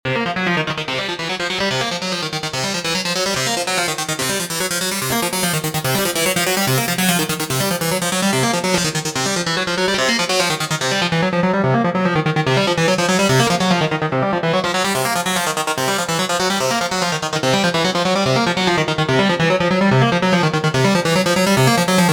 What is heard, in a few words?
FM monster, goa trance vital preset; JLS Pulsaris